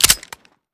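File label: pistol reload empty extended mags charge; gmod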